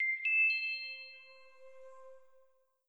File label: boot sound